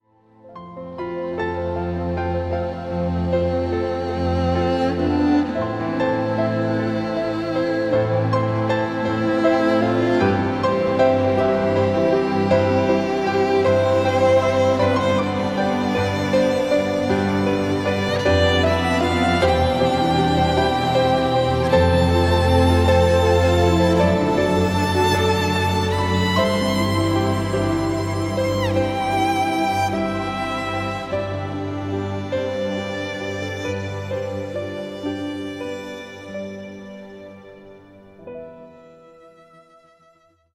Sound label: Emotional Soaring Uplifting; Scoring Reel